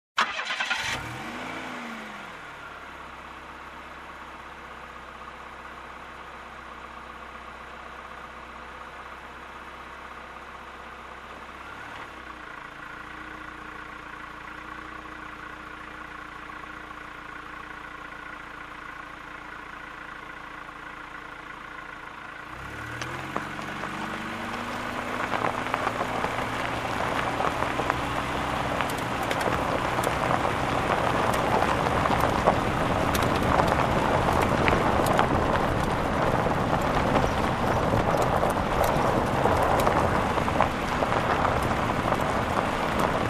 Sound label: Car driving on dirt road